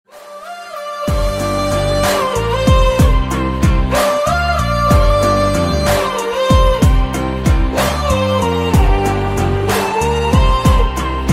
bgm ringtone

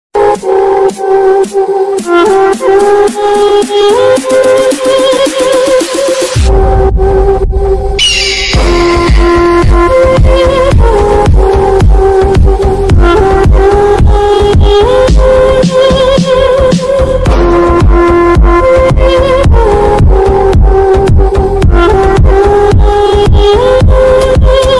attitude ringtone, english ringtone; eagle

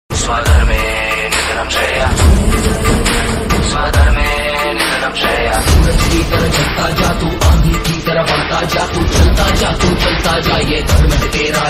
action ringtone; shershah movie

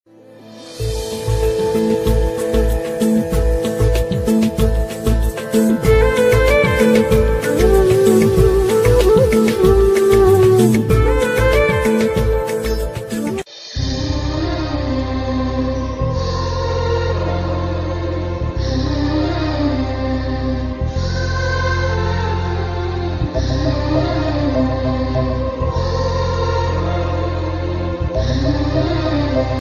telugu bgm ringtones south indian bgm ringtones; Ishq movie

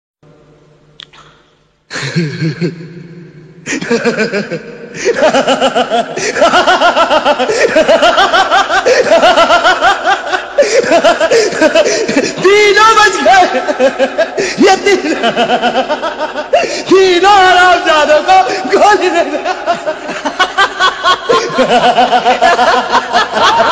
gabbar laughing ringtone; sholay

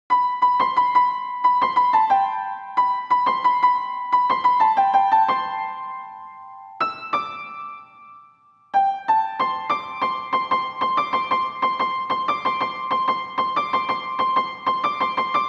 instrumental ringtone piano; jawan

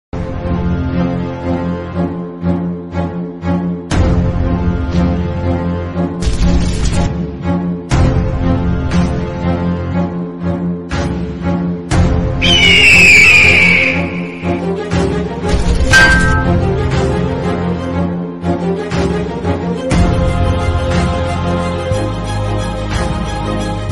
bgm and ringtone; malaikottai vaaliban; malayalam south movie

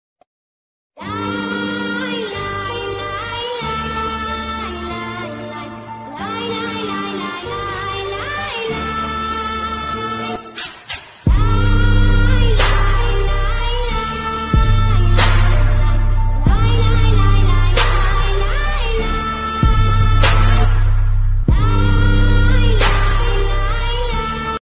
ringtone bgm; Joker

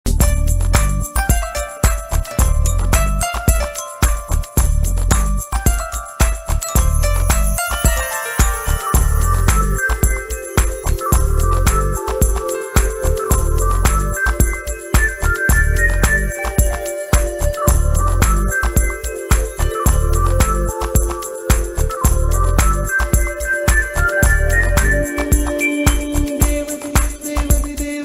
bgm ringtone tamil love ringtone

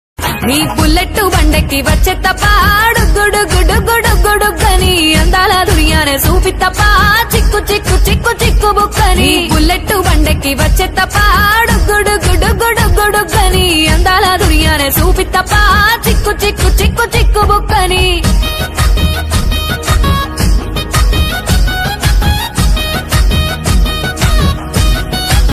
folk song ringtone telugu folk song

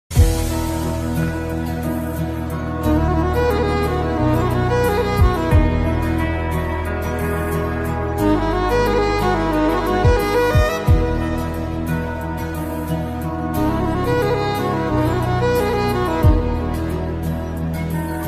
love bgm ringtone; republic movie

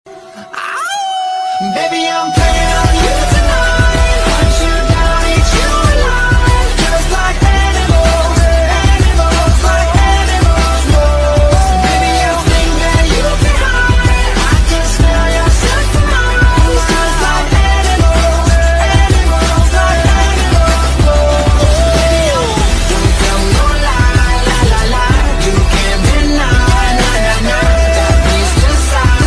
animal ringtone

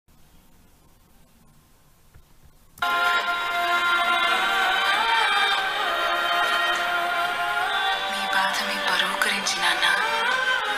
climax bgm emotional ringtone; sudheer babu; krithi shetty

